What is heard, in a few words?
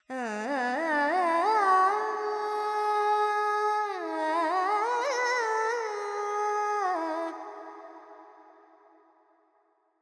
FX Phrygian, Carnatic Female Alaps; Sample Banks